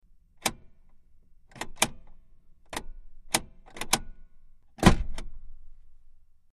Wagoneer: Parking Brake Lock / Release, From Interior Perspective, Jeep Wagoneer